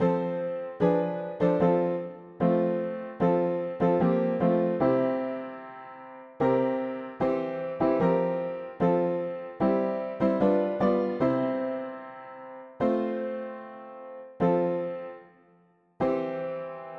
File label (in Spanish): mono piano; hymns; Himnario pequeno 2Pte